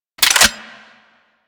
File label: Four Barrel Rifle Mech Load